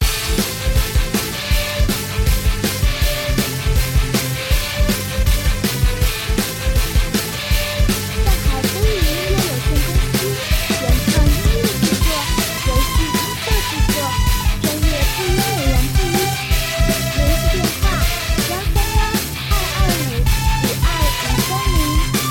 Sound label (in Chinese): 激烈-电子-战斗-日系